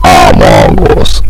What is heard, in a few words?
amogus sound; SussyCraft; amogus general